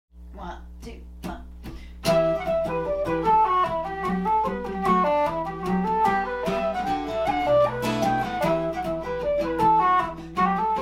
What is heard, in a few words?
Flute and guitar